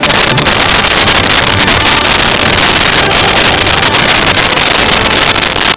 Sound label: flourish